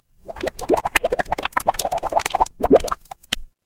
water bubble

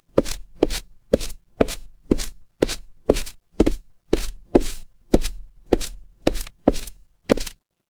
footsteps concrete

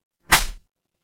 paper fabric cloth whip

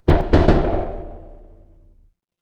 door knock

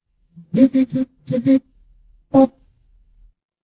office phone vibrate

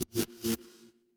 feedback error

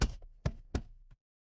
magic scifi shield

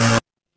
feedback error

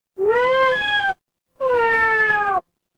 animal cat meow

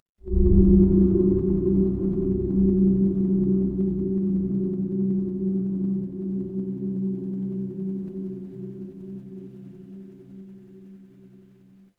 horror ambience dark

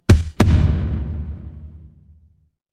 transition braam

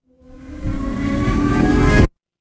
transition riser

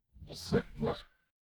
ui undo

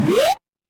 retro game jump

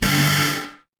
feedback buzzer

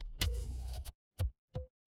mechanical machine

